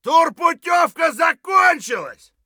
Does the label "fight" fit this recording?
Yes